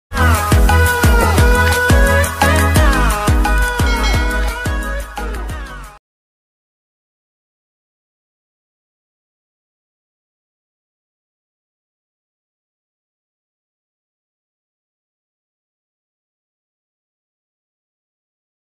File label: bgm music violin bgm music; Arya